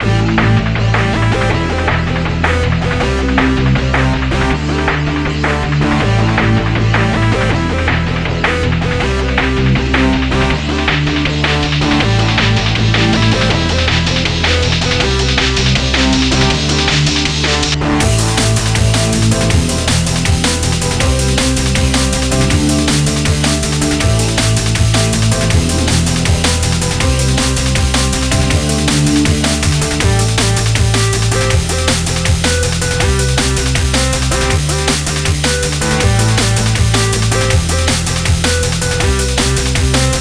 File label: Distortion Drum